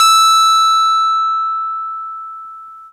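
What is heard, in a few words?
SCARY RHO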